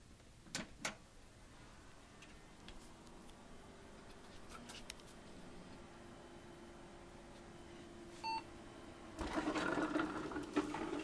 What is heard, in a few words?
turning on an old computer